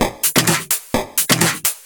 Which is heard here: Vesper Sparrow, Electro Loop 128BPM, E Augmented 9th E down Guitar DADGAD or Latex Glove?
Electro Loop 128BPM